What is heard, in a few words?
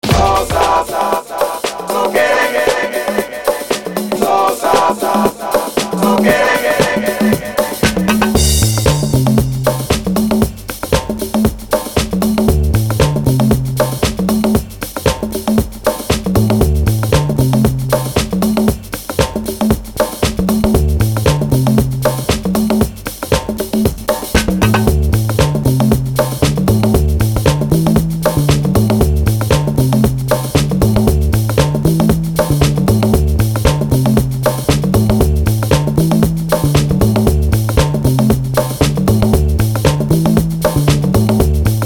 Guiro Electro